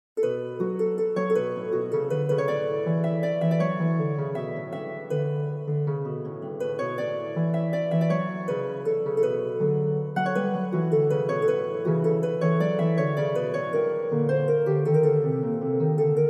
Harp

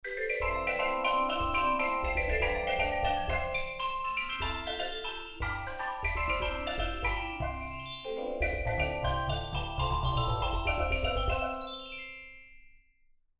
Perc

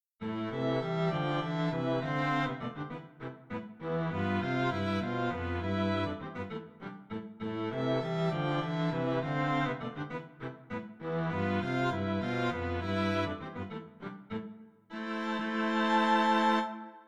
Sententia for String Quartet

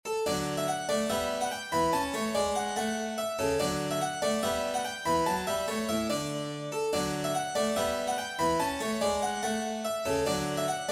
Cembalo Sonata D Major Rondo; Willibald

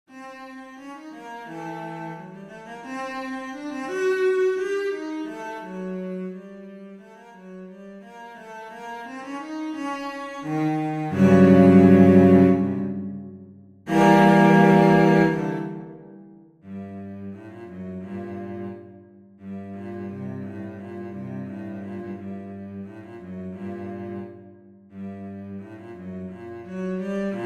Exercise Solo Cello; Messiaen Exercise Solo Cello

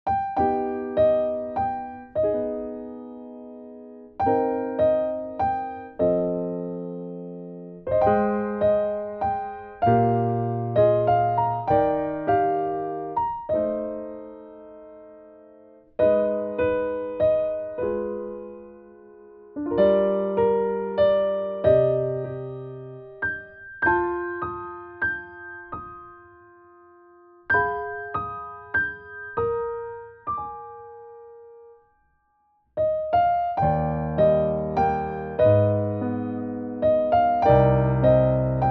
piano

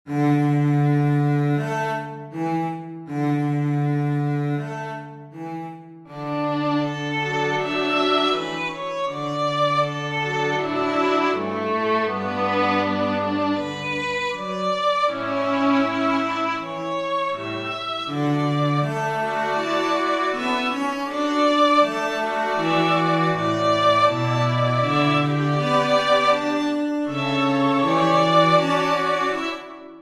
String Quartet

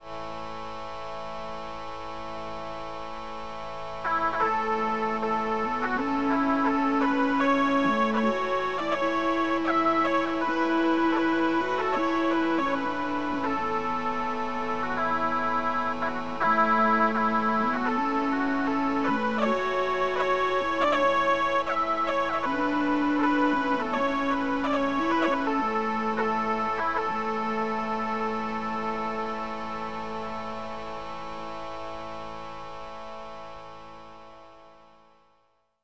Bagpipes and Fiddle-ing Around

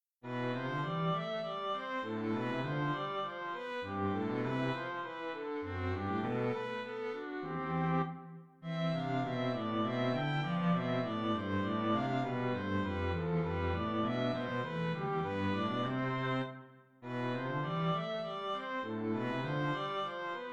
Sententiae for String Trio

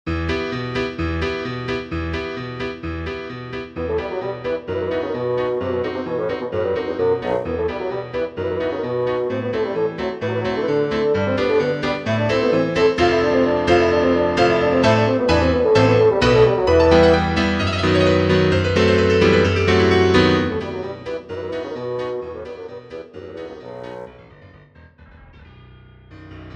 Scherzetto for Bassoon and Piano

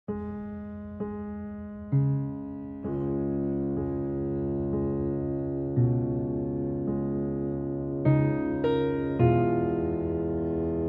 Flute, Bassoon, and Piano; Paraphrases on "Attic Ambience"; From the game myPopgoes

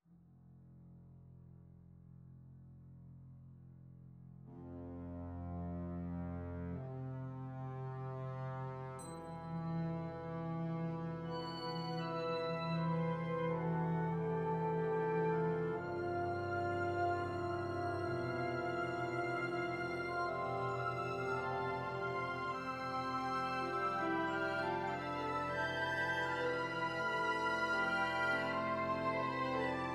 New polyphonic A section

